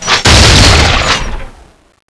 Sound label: reload; cstrike; weapons; gungnir